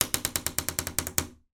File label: household door wooden creak old loud slow